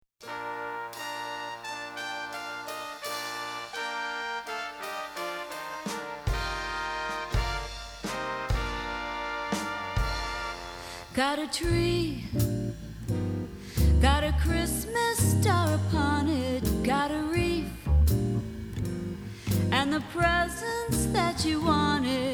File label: Jingle Bells Ring For The Lonely